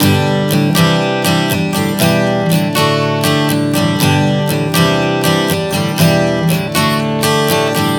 G-C-Em7-D 120 bpm, acoustic guitar chord progressions